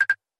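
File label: notification tone ringtone, sms; bialowieza; nokia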